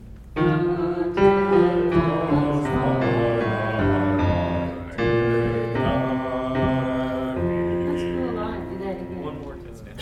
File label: My Country Tis of Thee basses last line; Gather Hymnal